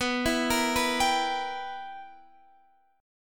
B Major 7th Suspended 4th Sharp 5th Guitar DADGAD, chord